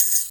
Perc, Korg Wavestation

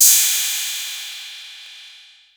808, CYMBAL; CYMBAL P2

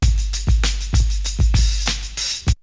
Drumloop; ZERO G - Datafile DISC 2

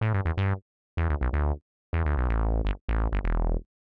125 res synth bass E; PowerFX - Nu Jazz House

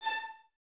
Violins A 5, String Ensembles; Miroslav Vitous; LINKS ORCH

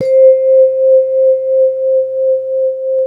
MLT VIBE

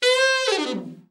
ALT FALL, ALT-SAX FALL; Giga Samples Collection

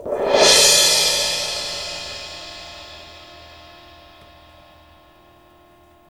CYM MLT

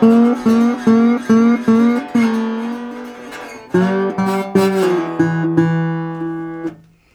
Blues Guitar Samples; ILIO - Fretworks

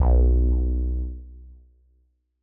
M Elec Bass B1; KG MegaSaur

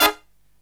HIGH HIT, Brass Riffs

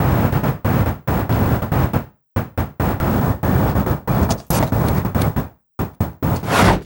Envelope Beat; Drums & Drones

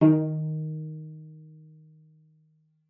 cello pizz D#3; 90 s Sample CDs; Xsample VOL-5

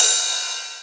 Ride Cymbal

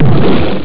glonk; Amiga Soundtracker Sample Packs

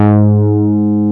C 2, MINIMOOG; Rubber Chicken Software Sample Bank

